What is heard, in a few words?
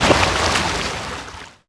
Oars Water; Civ4 warlords